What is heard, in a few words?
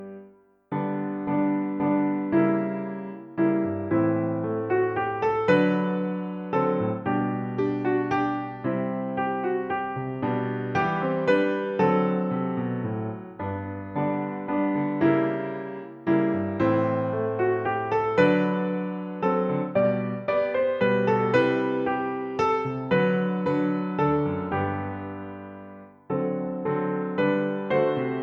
Piano, extract